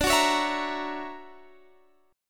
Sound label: D Augmented Major 9th down Guitar Standard; D+M9 strummed; JGuitar